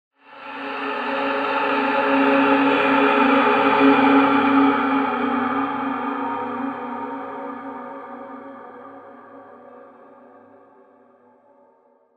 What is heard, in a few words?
airy breath